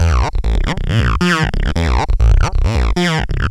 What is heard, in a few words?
SYNTH